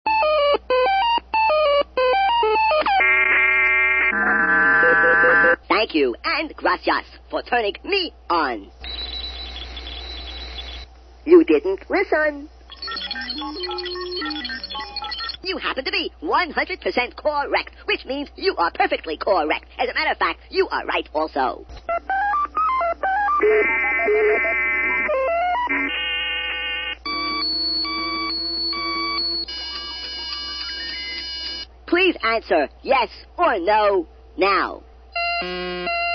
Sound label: malfunction